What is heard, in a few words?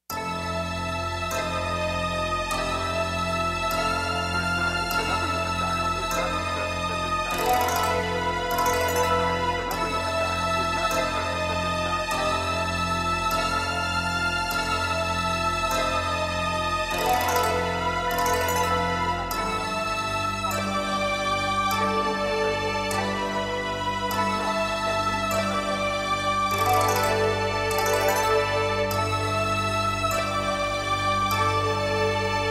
bgm ensemble